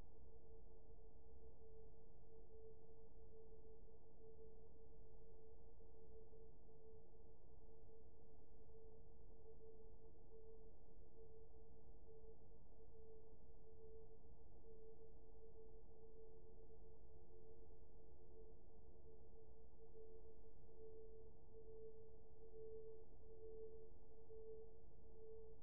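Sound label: hydrophone; ICLISTEN AF2534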